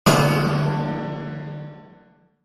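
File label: Impact Metallic Scrape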